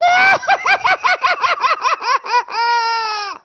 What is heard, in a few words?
Lachen laugh